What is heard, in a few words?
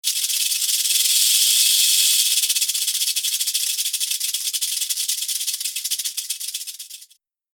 Rattlesnake tail rattle